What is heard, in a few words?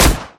glock, guns